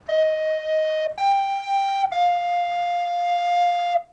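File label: Shepherd Flute E flat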